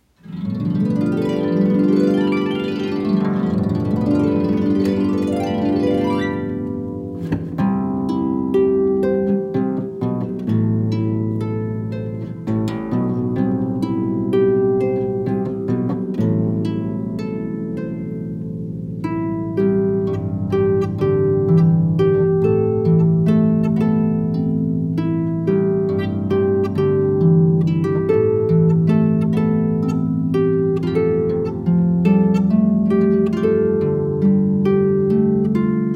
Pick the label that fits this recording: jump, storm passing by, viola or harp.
harp